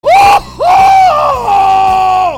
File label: helm scream